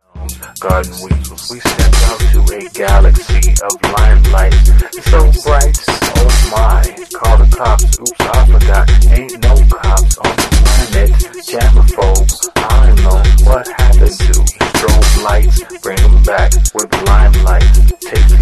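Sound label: instrumental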